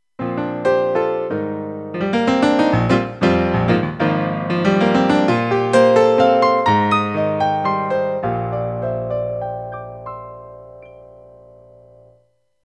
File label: Piano